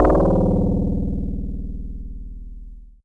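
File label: Easy Clav DX100 D#2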